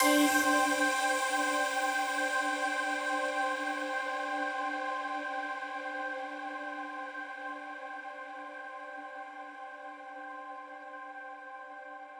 Ahh C; 99Sounds; Simply Space

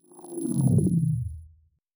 Power Down, High Tech Interface Sounds; Lesser Vibes

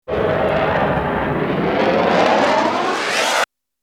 Bullet Time; FXHome; Bullet and Gun

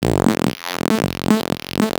Bass drone G, Circuitbend; Drones - Bass; 99Sounds; Mangling Audio

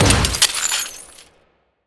impact; Bluezone; Cinematic Impact Sound Effects

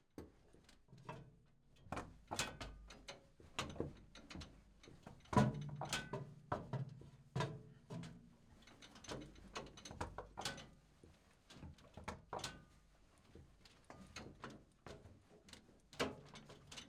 Strv 121 - Mock Driving; Leopard 2A4 1989; MS Decoded

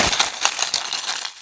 hit, Processed Foley; Sound Design Tools

